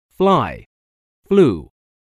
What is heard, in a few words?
fly